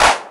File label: clap one shot mid saturated; Sample Magic; Classic Chicago House; Drum Hits